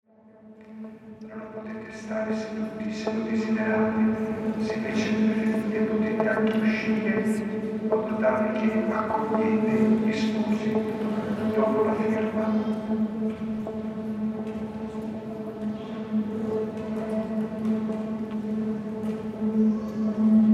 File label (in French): resonance